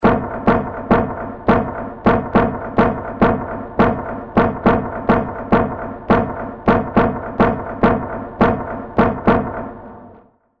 Session Noise Baton Groove Left

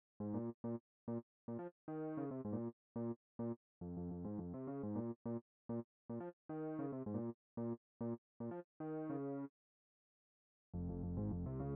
Bass Line top end

